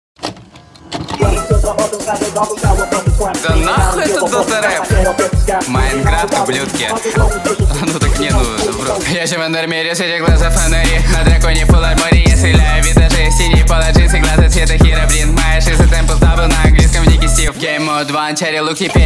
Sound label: minecraft rap